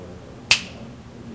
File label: finger snap